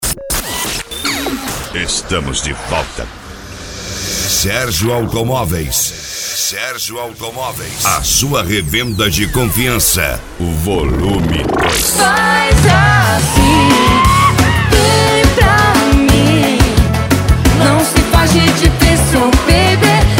Sertanejo Piseiro; Sergio Automoveis Vol 02; Parobe RS

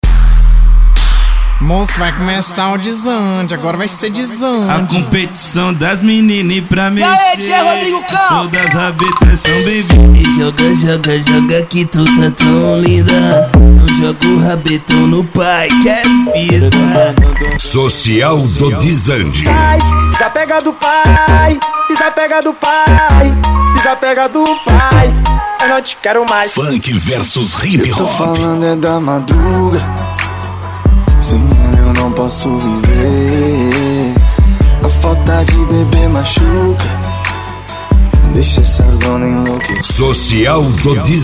Funk Vs Hip Hop

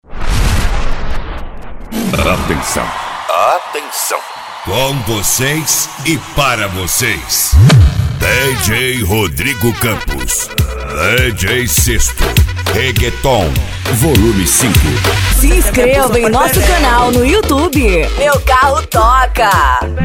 Reggaeton

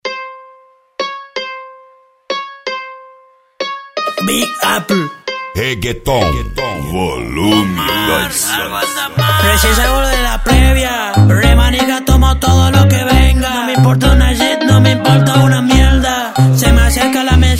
Reggaeton

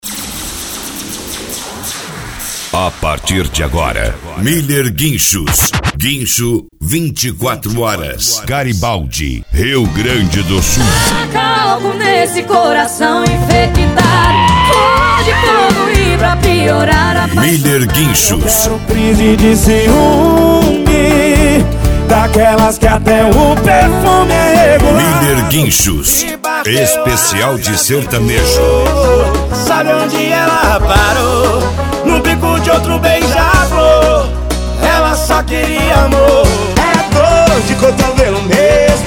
Sertanejo

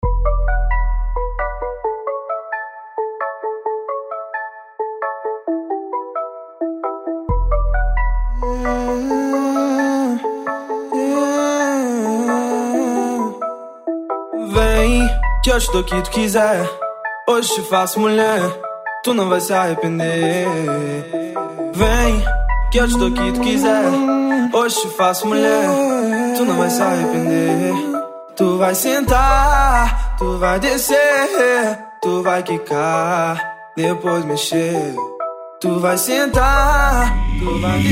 EletroFunk Funk; Infinity Studio 2024

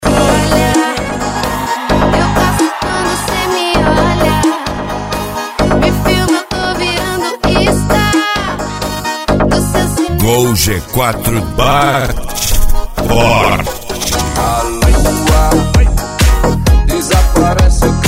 MegaFunk

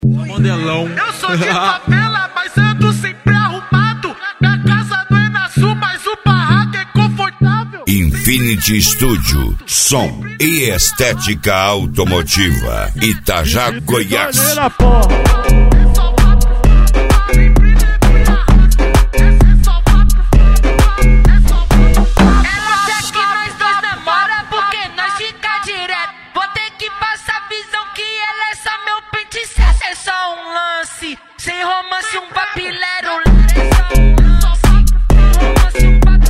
EletroFunk Funk; Infinity Studio; Itaja GO

